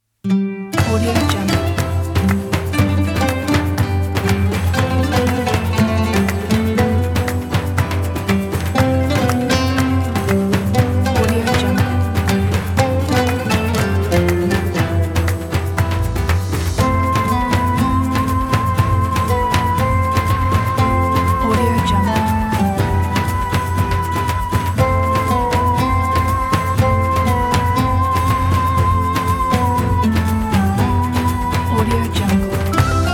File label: welcome ramadan